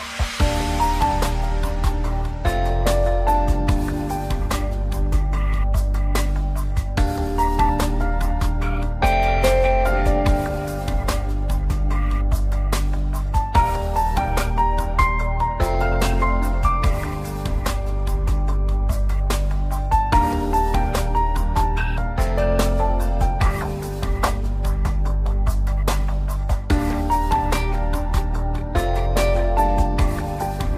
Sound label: IVR Musical Background